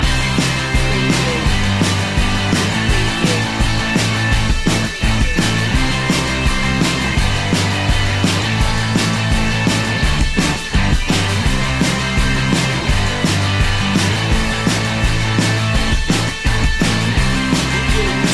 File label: indie party loop